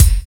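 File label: brite fat kick; Drums In Your FACE!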